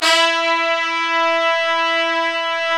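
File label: trumpets section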